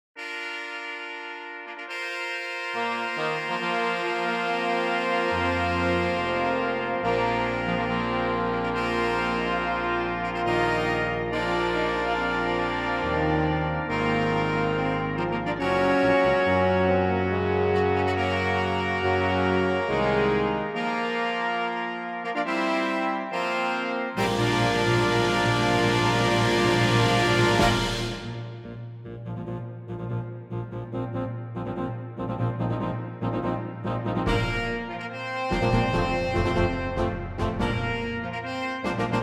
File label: Heroic Fanfare